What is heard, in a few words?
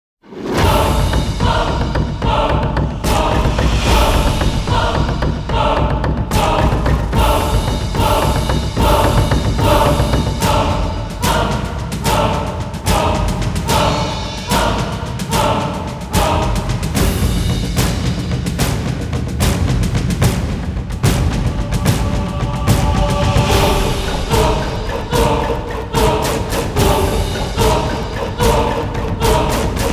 drums and vox